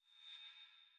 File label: Cave Echolocation Platform